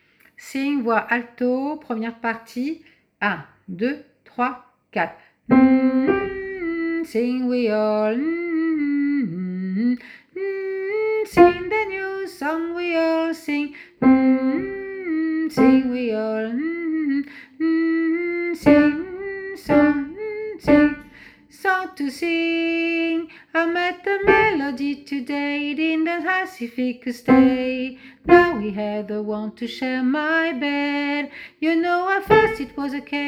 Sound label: alto